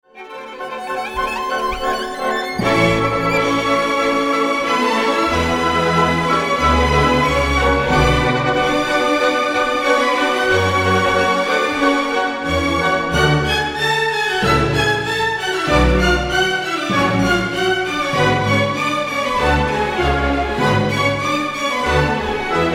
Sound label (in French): concerto pour violon, allegro moderato; TCHAIKOVSKI